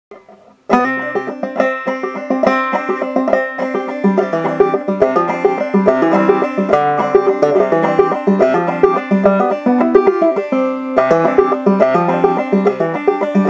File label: tab juniper jig, banjo